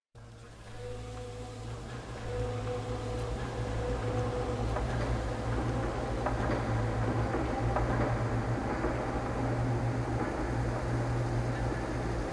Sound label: Backhoe And Birds